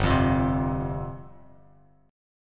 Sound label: G 7th Suspended 4th G down Bass Standard; G7sus4 strummed